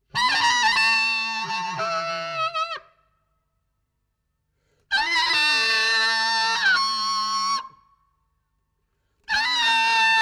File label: alto sax